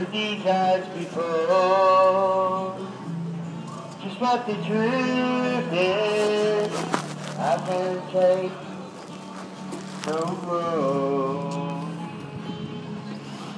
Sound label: busker; cornmarket